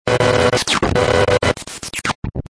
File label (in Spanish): ERROR FALLO